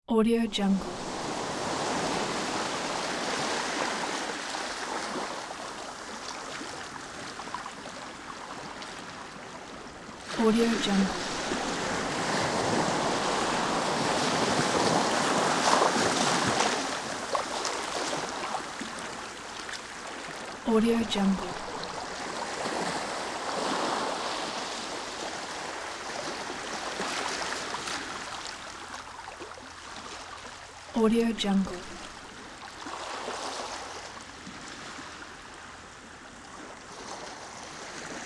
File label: ocean beach ambience